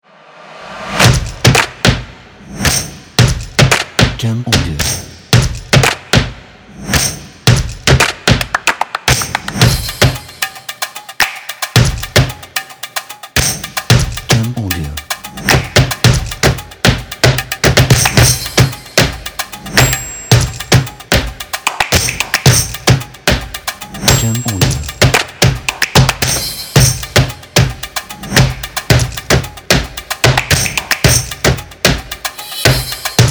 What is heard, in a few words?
Stomps Action